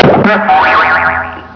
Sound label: cartoon